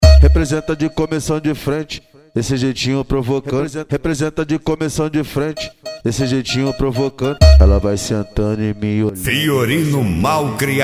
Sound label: mega funk bass